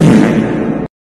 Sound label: fart